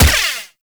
Rifle Lancer Gun Shot; Warframe; Narmer; Weapons

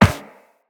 Run Metal Perforated, Footsteps; Characters; Operator

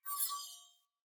Sword Whoosh Accent Long; Fey Sword

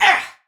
Attack Effort; Warframe; Tau; Dax; Ninja

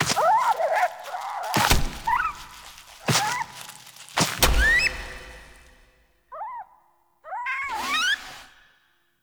Approach SFX; Warframe; Duviri; Cinematics; Drifter Approaches Warframe

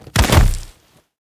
Bodyfall; Sacrifice Forest Night; Ballas

